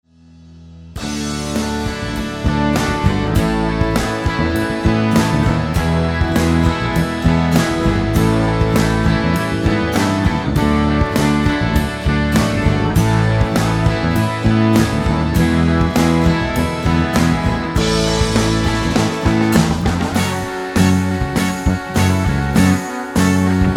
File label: Jesus Calls Us instrumental